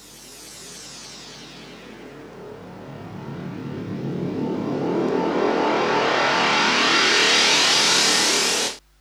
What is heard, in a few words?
rise, fx & sweeps; EZRollers